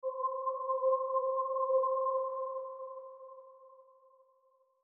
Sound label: lead; bespoke - Floral v.1